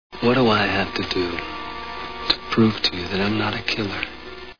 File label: scream